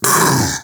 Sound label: Infested Splitter Split Vocal Pain; Warframe; Grey Strain